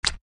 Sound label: Slide; Lewd SFX